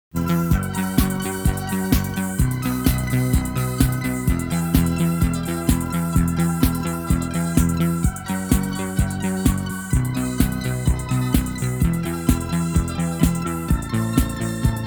instrumental

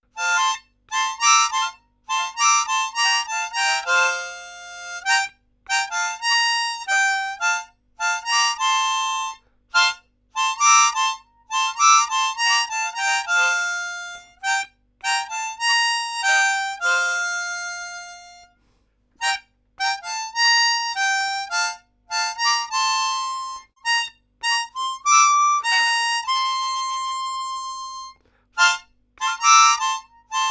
harmonica; sayonara zetsubou sensei

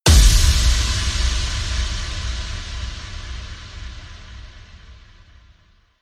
hit big; brand x music; discretion

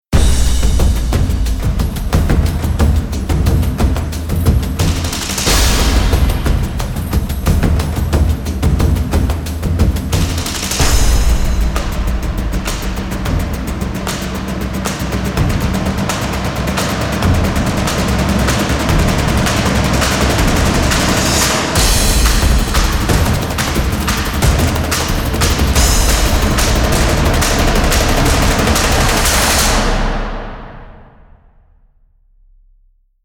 percussion only version

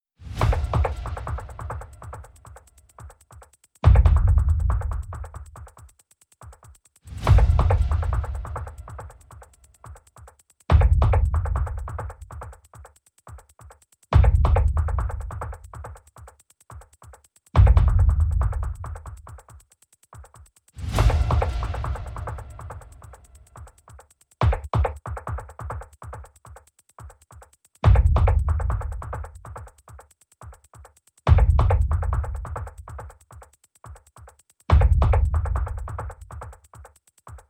perc only

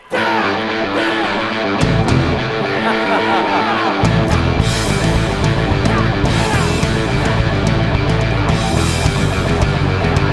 ogre battle instrumental

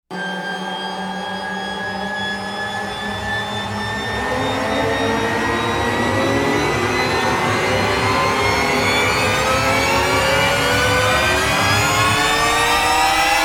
crawling rise

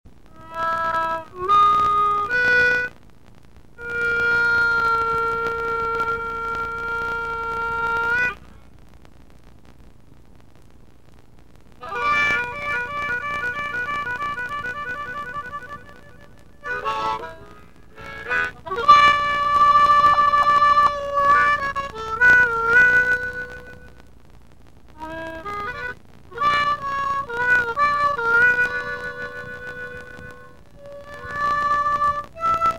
harmonica

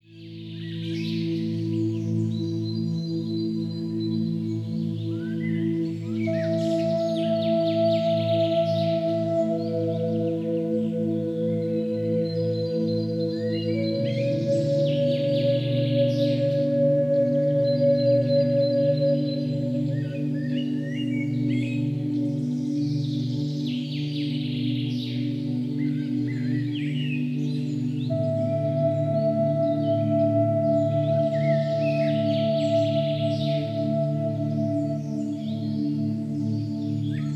healing music birds singing in forest; guided meditation music zone

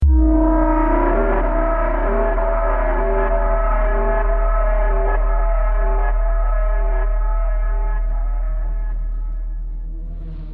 instrumental

